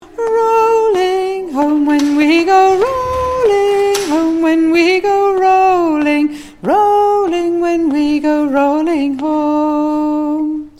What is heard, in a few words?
Chorus Alto